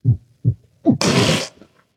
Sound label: Clear Throat; smite; osiris